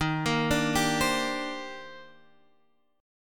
D sharp Major 13th D sharp Guitar Half Step Down, chord